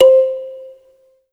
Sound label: Yamaha TG100 Kalimba C5